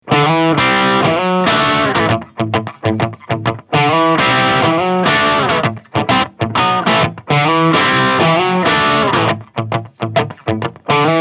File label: Solar Guitars A16D Crunch